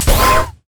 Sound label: crit attack; rumble skin03